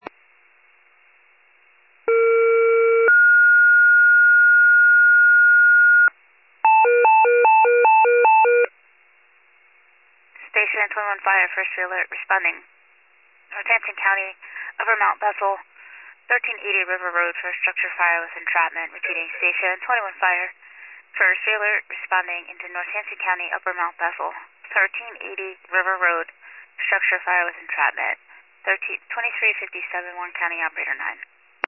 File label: fire, pager alert